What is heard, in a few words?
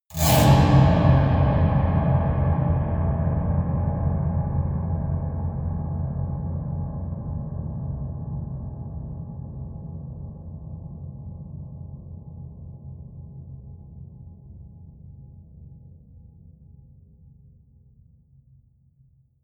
piano twang multiple fast low